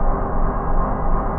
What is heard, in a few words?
slowfield laser loop; grenades